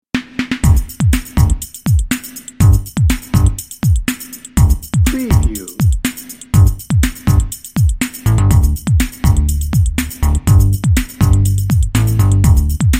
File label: futuristic vision dnb